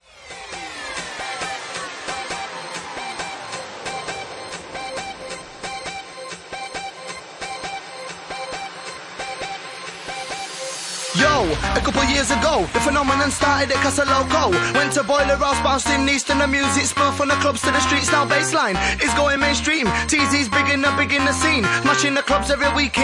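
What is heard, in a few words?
Bassline Remix, Bassline House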